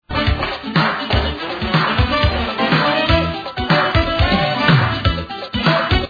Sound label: conga